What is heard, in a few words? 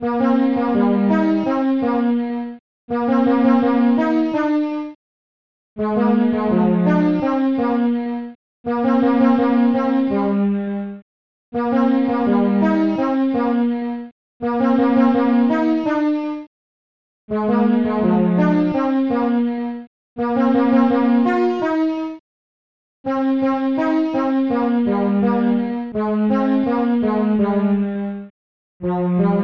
boot music; Spidersoft Utilities; BetterBootScreen